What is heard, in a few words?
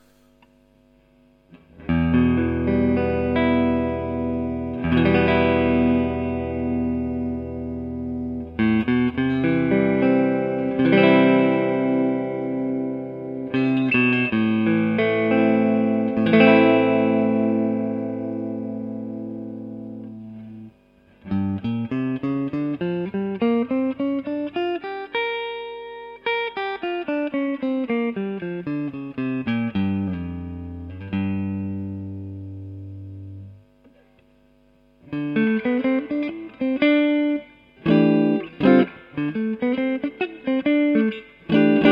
electric